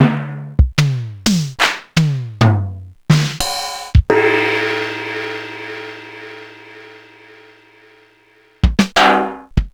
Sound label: EMU E-drum